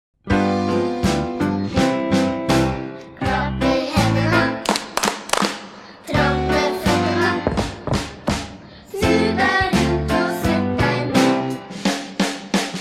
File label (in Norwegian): Klapp i hendene